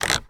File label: car temperature dial move daewoo nexia automatic; transportation; sound effects